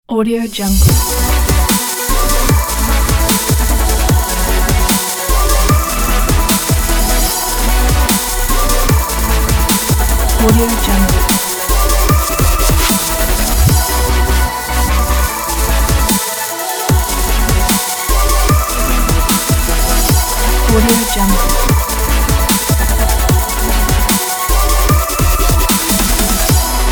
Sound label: modern future bass